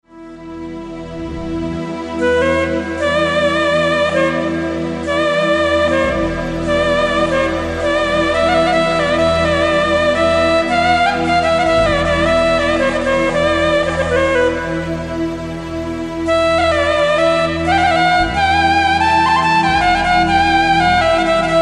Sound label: taksim kaval yamaha psr 9000